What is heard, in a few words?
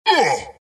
wraith pain; dota; skeleton king